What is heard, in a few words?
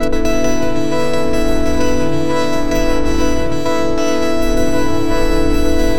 celestial tones ambient s